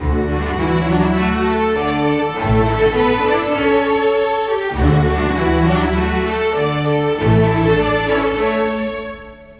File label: Strings; Sonic Foundry (Sony Creative Software); Orchestral Series VOL-4; Rock and Pop; Rock-Pop 05